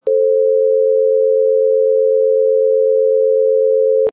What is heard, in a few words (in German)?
Kleine Terz Rein, Geige